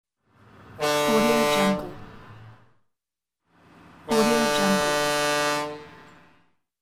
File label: ship horn